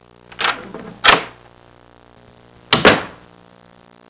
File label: door opening